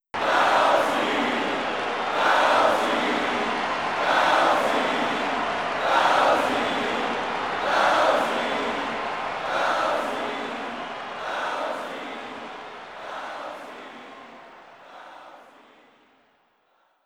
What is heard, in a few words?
Crowd Chant; mario strikers charged football wii gamerip 2007; Bowsie